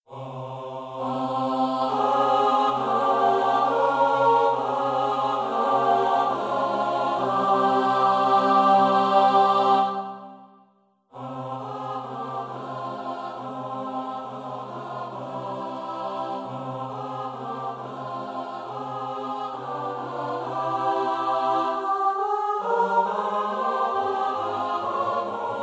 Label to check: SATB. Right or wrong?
Right